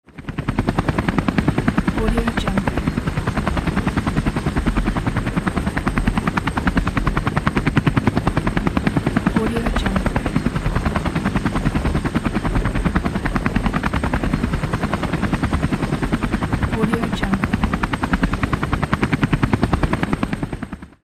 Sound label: helicopter